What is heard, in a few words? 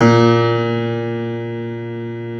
A 1 PNO; Keith Emerson Signature Series